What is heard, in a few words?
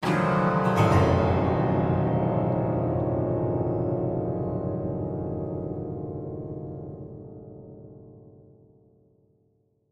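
Grand Piano Strings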